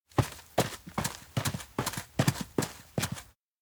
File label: walk jet; anemoi パッケージ版